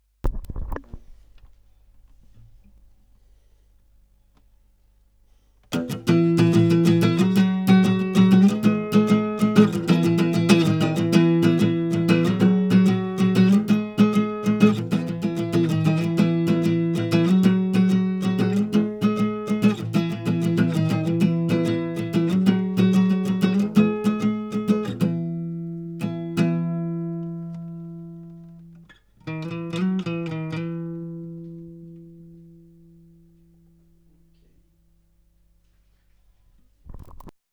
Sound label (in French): Guitare